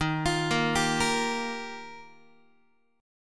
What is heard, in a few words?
D sharp Major Add 9th, Guitar; arpeggiated; D#add9; JGuitar